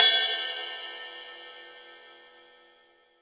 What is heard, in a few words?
CRASH